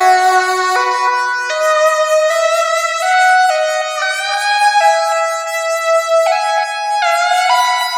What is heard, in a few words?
xtra lead